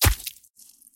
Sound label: squelch; Interactions